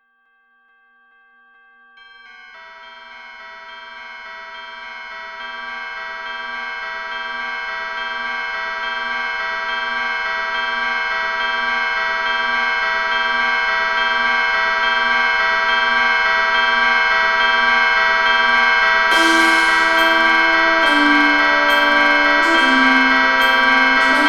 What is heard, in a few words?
BELLS